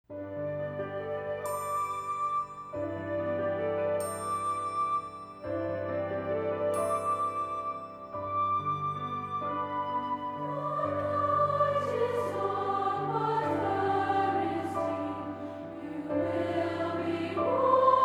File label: lullaby